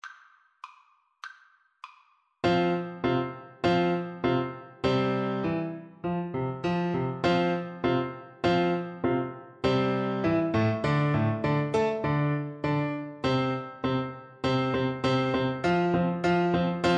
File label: Turkish March, Trombone